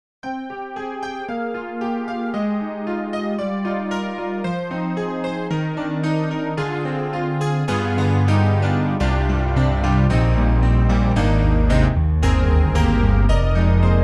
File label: Soulful Stack; Steinberg; HALion 4; Soulful Stack 1